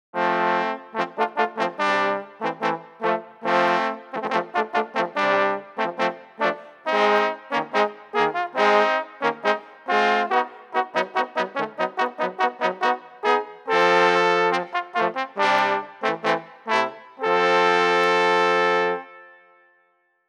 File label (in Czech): fanfara